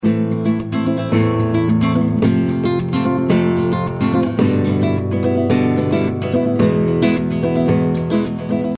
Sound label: Chords Loop